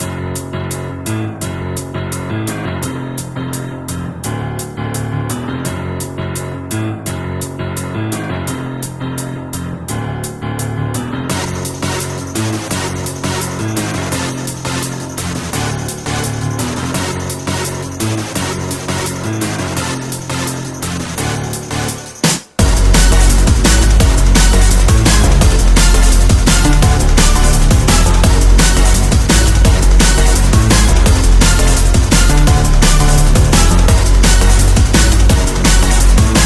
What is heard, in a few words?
Remix Instrumental